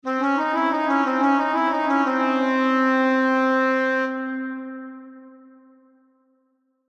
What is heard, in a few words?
Woodwinds Exotic, Woodwinds Exotic Melody; Musical; Blastwave; Sound Effects:Musical